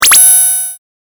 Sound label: game effect